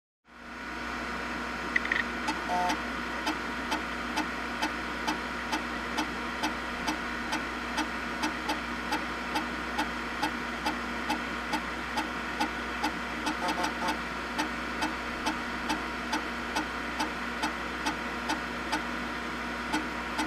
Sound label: Computer writing data